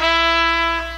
trumpet; TRUTH-HZ; Crowdsourced Sample Pack; Brass & Wind

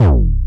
DFAM, Drum Kit Kicks; Bottom Sniffer

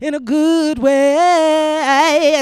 vox; TRUTH-HZ; Crowdsourced Sample Pack; Crowdsourced Live Stems

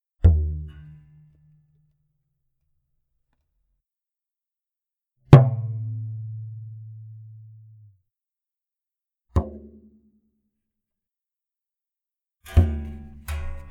ToyDrum Tom; Crowdsourced Sample Pack; TRUTH-HZ; Drum Kit; Percussion

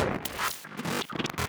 glitch, Digital FX

